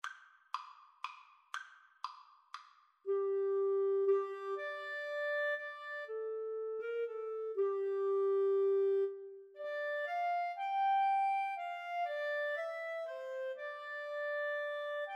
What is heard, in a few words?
Clarinet Duet